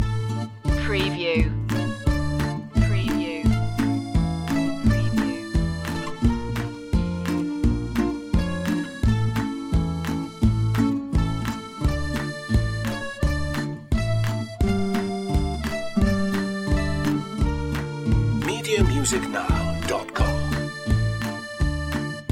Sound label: russian romance loop